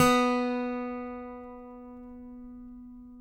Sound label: ACGT F BOP, ACCGTR PICK, Country